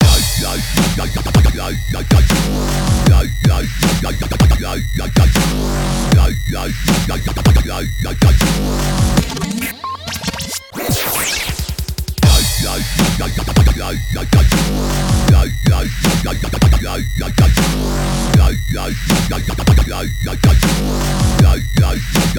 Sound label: mainstream dubstep glitch hop bass